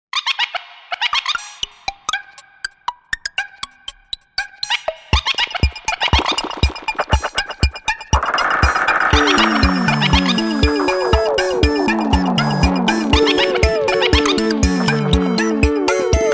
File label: Monkey Synth